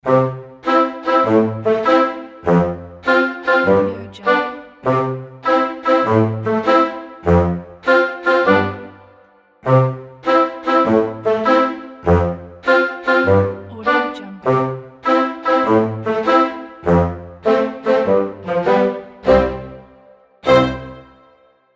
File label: background comedy fragment; kolokol